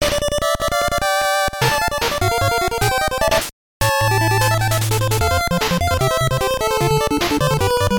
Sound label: title screen intro; rambo